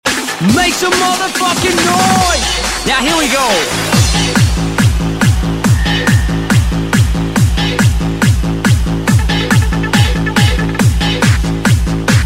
Bass Mix

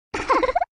Giggle

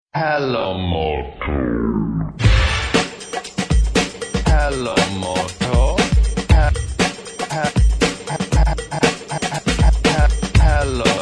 Ultraremix

